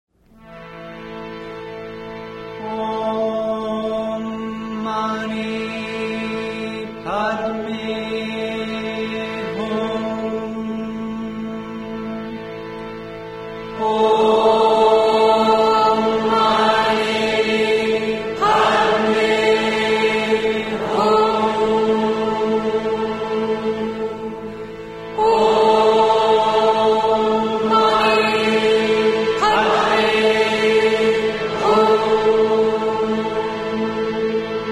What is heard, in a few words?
Om Mani Padme Hum